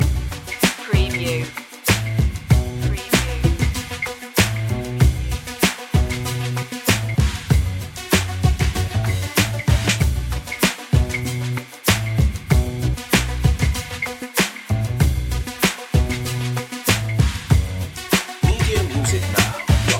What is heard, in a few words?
funky hip hop loop